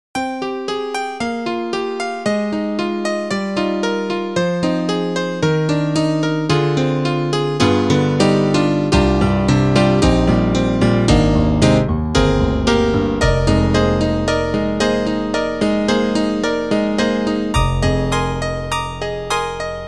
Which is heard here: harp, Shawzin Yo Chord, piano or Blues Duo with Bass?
piano